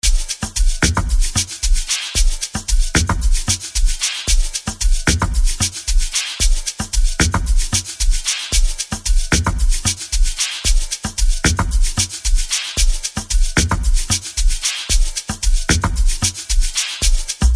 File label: Piano Mix